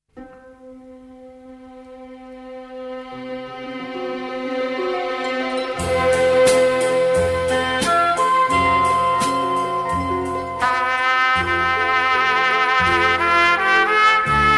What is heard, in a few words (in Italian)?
tromba